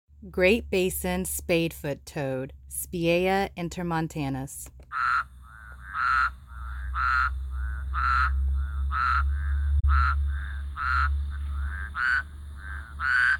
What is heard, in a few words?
great basin spadefoot toad